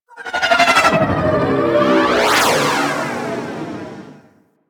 Fighter Ship Attack Maneuver, Engines; Warframe; DE; Vehicles; RailJack; RailJackEnemyShips; Grineer; EnginesGeneric; Maneuvers

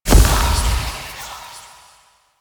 Shadow Smoke Teleport; Warframe; Duviri

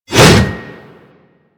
Axe Heavy Swing; Warframe; Weapons

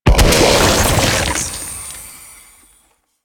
Infested Drop Pod Destroy; Warframe

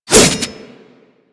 Light Glaive Whoosh; Warframe

